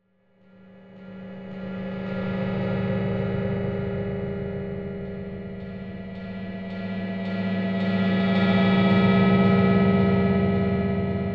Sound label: sound design reversed metal droning clangs